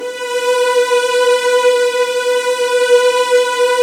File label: B2, Virtuoso Strings